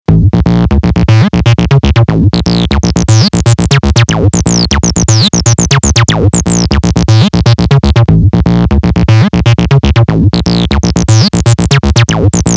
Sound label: Distortion